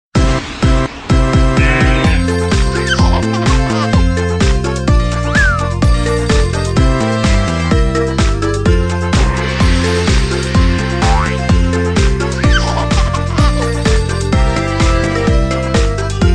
new year bells; Weihnachten; Klingelton

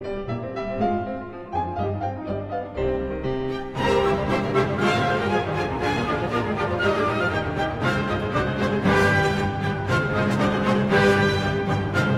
Piano Concerto, Klingelton

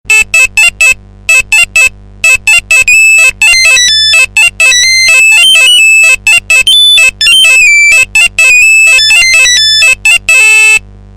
Nokia Hurdy Gurdy; Nokia Tune No Amber

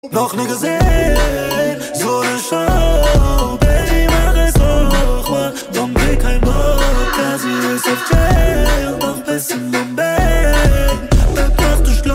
Baile Funk

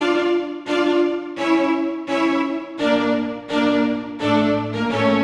dramatic high end; Filmmusik; Klingelton

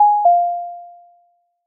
Soft Bell

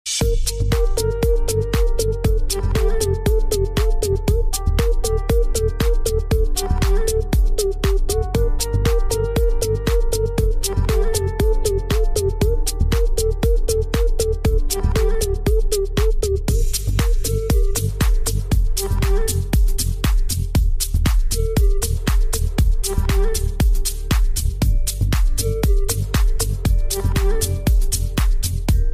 Samsung Galaxy J2 Pro, klingelton

